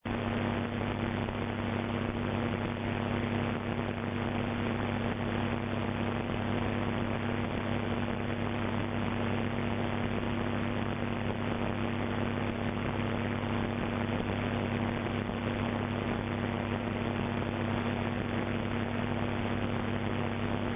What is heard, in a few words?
el noise